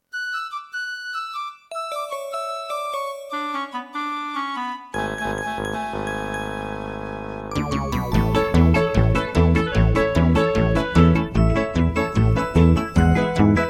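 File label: backing track; det skaya pesnya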